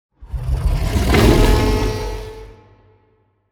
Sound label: kaiju adult ship entity rage; biogenesis